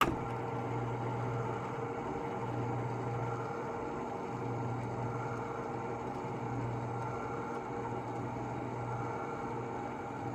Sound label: Projector screen, automated, motor, canvas, roll up, catch; Eiravaein Works; Vaeyan IV